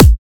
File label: Kick Dubstep